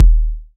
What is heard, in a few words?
BD, BD Dance